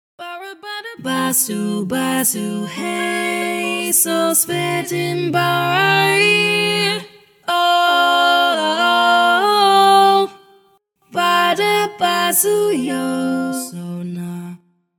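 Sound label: SSAA Baritone, barbershop tags; Each recording below is part predominant - one part louder, other parts quieter.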